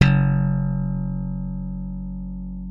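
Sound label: left thumb F#1, slap thumb; Xsample VOL-1; 90 s Sample CDs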